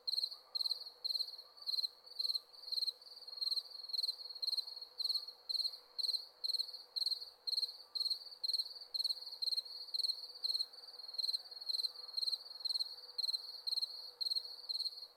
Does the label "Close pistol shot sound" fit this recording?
No